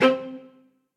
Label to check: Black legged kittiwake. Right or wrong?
Wrong